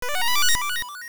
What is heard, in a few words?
Dungeon Teleport Appear; A teleport spot (to the dungeon entrance) appearing